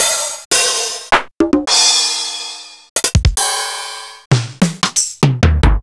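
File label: Roland MV8800 drum 9K; THE 9K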